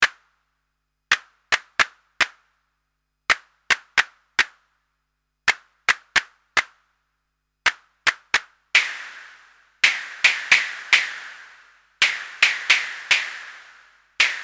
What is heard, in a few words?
Clap